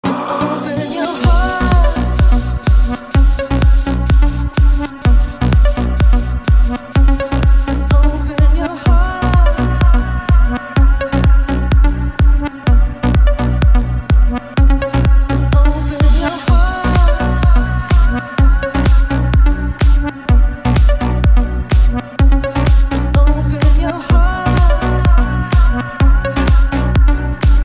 dirty south electro

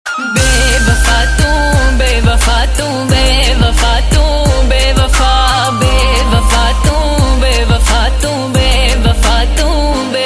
sad punjabi

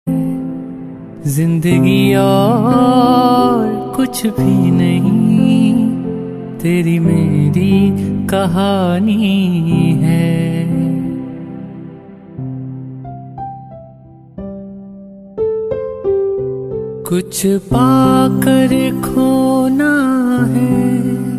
tamil love

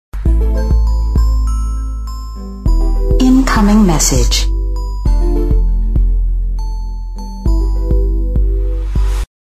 message

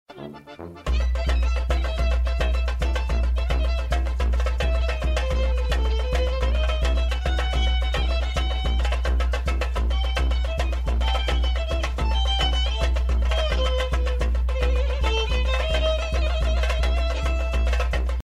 jungle

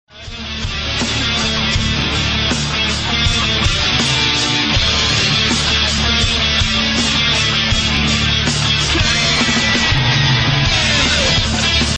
hiphop

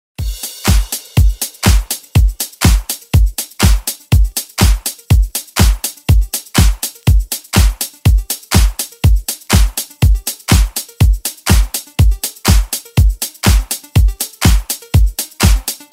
electro

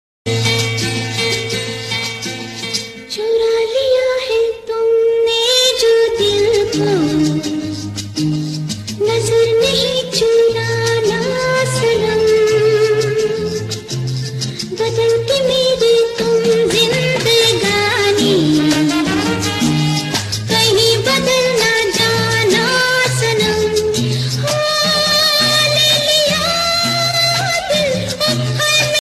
female

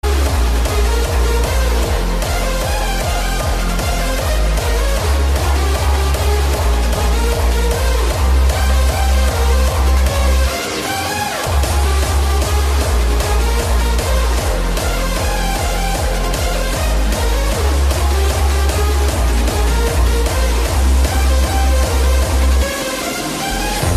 trance; belgium

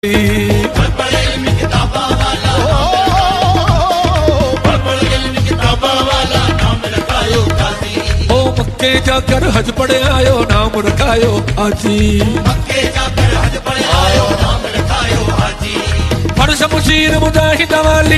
pakistani romantic, ringtones

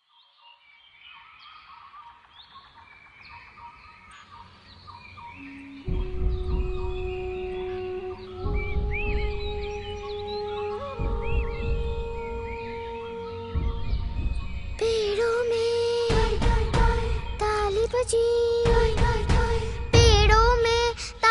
cartoon, ringtone

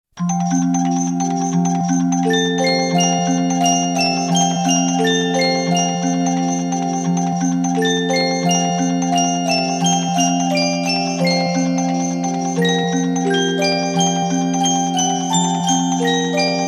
baby instrumental

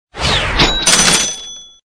coin machine notification

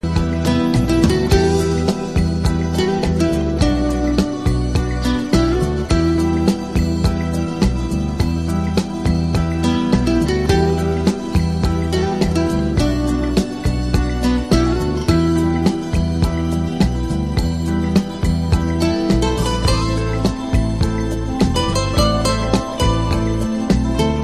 sweet arabic, ringtones

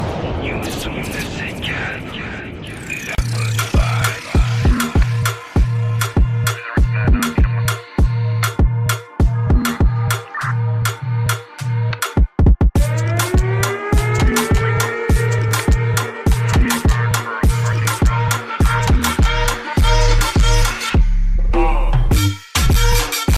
moombahton

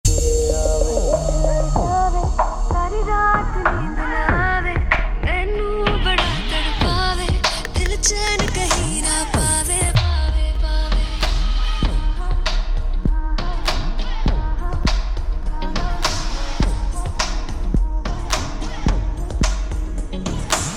trap

